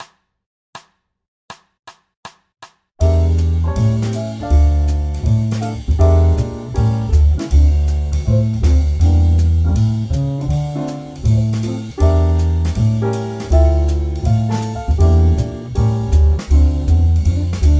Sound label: Em7b5 A7 Dm7 (2bars Loop) 160